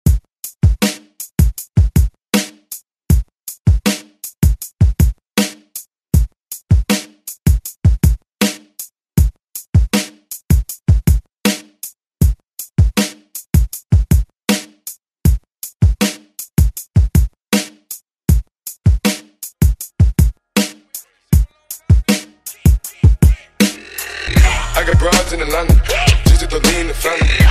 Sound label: Short Cut; Deejay Hit